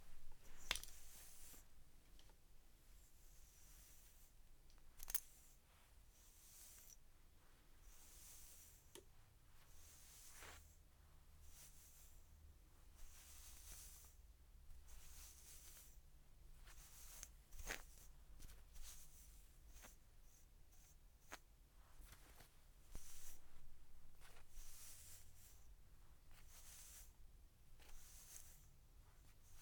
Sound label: Brushing Hair